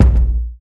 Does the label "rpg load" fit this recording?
No